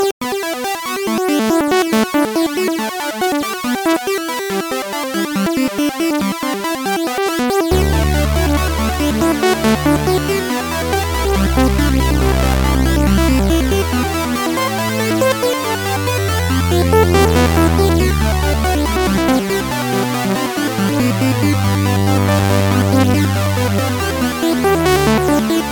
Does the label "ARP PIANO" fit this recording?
Yes